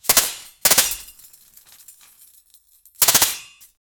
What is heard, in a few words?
suit attacking, armor; iron man